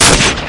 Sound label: steam revolver shoot